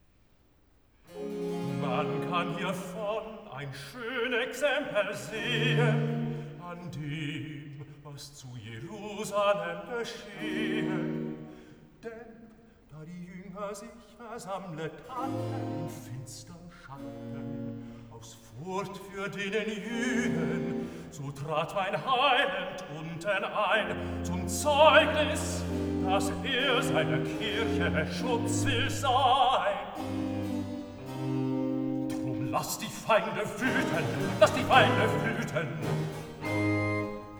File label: Cantata, Recitativo (Bass); BWV 42 'Am Abend aber desselbigen Sabbats'; Bach; Arnstadt; 1st Sun After Easter